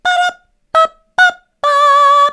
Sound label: bada bap, vocal